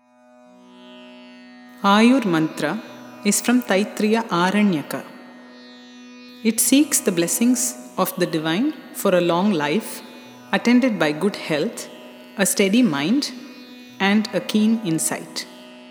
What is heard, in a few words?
Chants